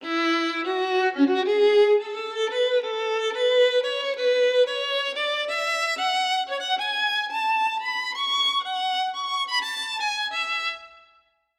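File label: violin vibrato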